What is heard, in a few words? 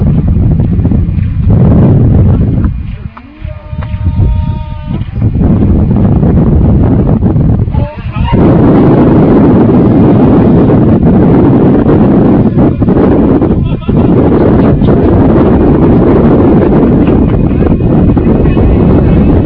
learjet failure; LEAR JET 京商製; モーター AP-29L スペシャル×2個; プロペラ ダクテッドファン×2個; 全備重量 2,600g（8.4V-RC2000 Ni-Cd×2個を含む）